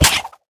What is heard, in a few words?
Rope snap